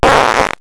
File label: fart